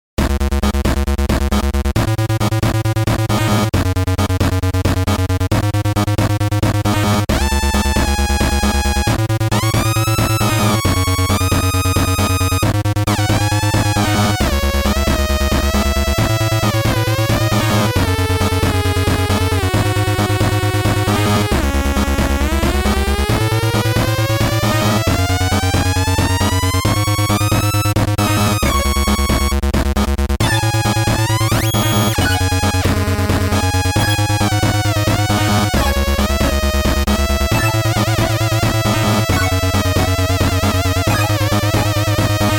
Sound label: Chipsounds, chipmusic; Plogue